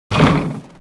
Ammo Cart Hurt Sound; Heroes3; War Machine

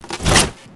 Dendroid Soldier Attack Sound

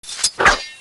Wood Elf Shoot Sound; Heroes3

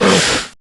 Lizardman Hurt Sound

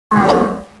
Pit Lord Defend Sound; Heroes3

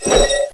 Angel Attack Sound; Heroes3

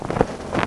Angel Move Sound; Heroes3

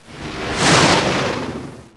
Storm Elemental Shoot Sound; Heroes3

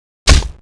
Zombie Defend Sound; Heroes3

